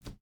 footstep hide wood jump start; Rust

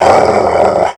dog growl

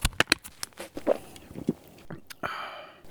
juice carton use; Escape from Tarkov

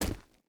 footstep rubber boots gravel jump land; Rust

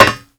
foot metal, footsteps; Call of Duty Modern Warfare

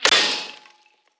damage hits explosions, Warships

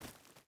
footstep barefoot forest walk; Rust

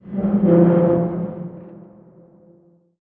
explosive objects; World of Tanks

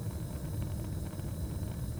pilot light idle; Rust

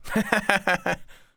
taunt hehehe

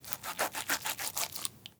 cut meat; Rust

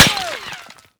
plaster small

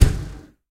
cockpit close; War Thunder

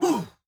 flinch light; Rust

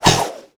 kiting whip light

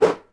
Axe Swoosh; Civ4 warlords

